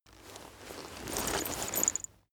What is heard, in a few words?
leather metal handling long